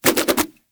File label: Nunchuck Spin Whoosh; Weapons; Warframe; Infested